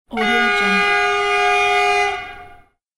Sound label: train loud horn; urban sounds